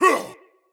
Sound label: attack grunt; smite; god odin